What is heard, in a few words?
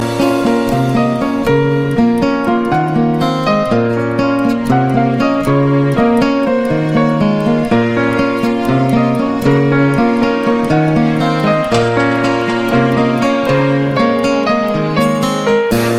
Love tone